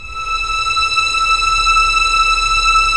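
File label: Strings Violins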